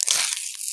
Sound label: leaves Digging; voxelverse